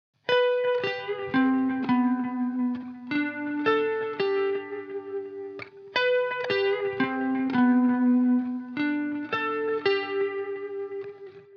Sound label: 170 BPM G Maj Lead Guitar; Cymatics; Miami Guitar Pack - Pt 2; Patter